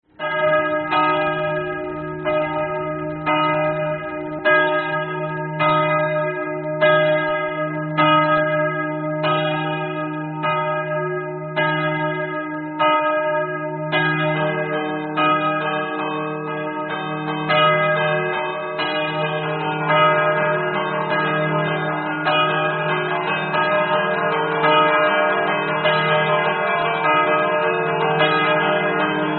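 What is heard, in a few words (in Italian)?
campane, church bells; srocco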